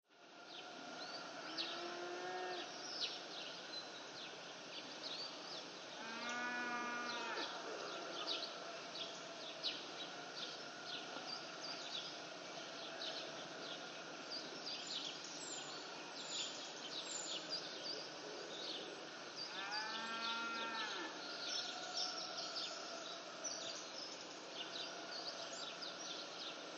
ANIMALS-BIRD BGS RURAL ENGLAND: October dawn, distant light bird song, distant cows, distant roosters, Sussex, England